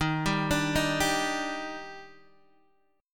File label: Eb Major 7th, Guitar DADGAD